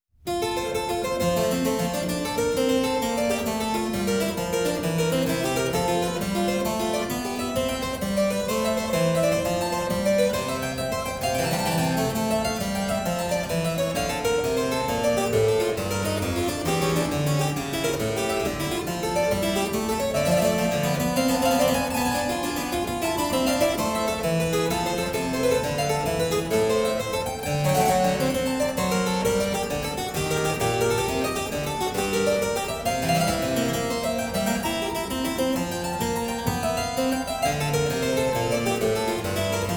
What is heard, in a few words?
Prelude & Fuge in F sharp major, Keyboard; J.S. Bach; The Well-Tempered Clavier Book I; No.13; BWV 858